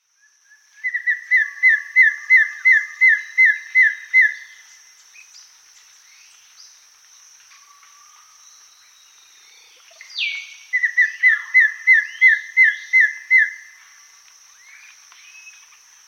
Goeldi's Antbird; S. America

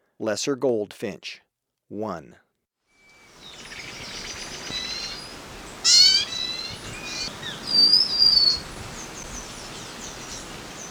Lesser Goldfinch, Birds of Ecuador; S. America

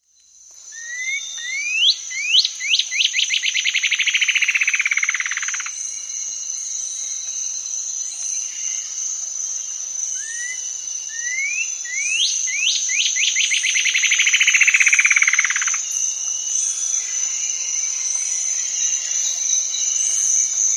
Cinereous Antshrike; S. America

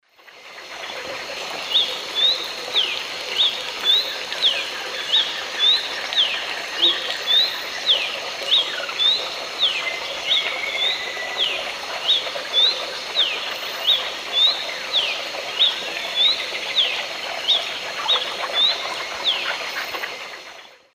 Paroaria gularis, song; S. America; Eastern Lowlands

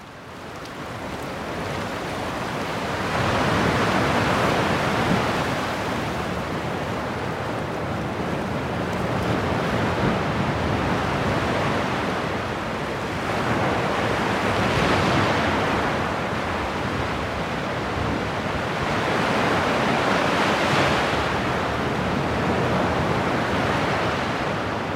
rain and thunderstorms

